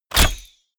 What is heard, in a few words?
bow shot